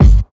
modern hip hop bass drum sound g sharp key

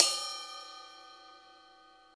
crash c key

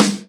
sizzle acoustic snare g key; snare drum samples

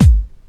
kick one shot g sharp key

